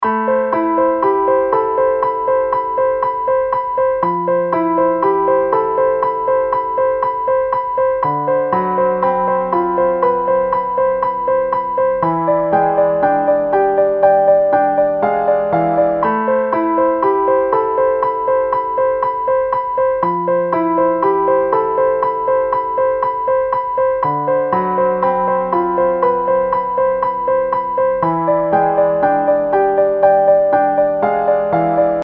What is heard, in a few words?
piano